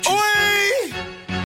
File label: Owheeeeeee